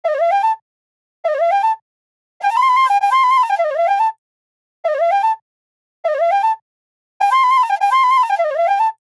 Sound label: Flute Loop BPM 100 A; Bihu; Fly; waves of bihu vol 2; Gully Loops